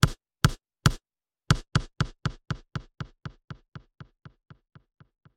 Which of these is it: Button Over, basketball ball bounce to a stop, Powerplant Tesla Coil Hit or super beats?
basketball ball bounce to a stop